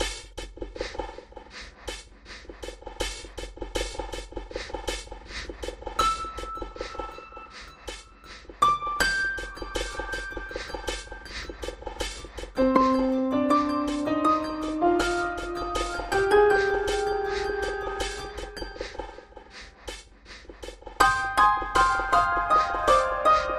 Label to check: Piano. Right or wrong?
Right